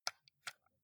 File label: console controller xbox home press slow